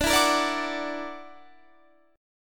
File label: Gb Augmented 7th D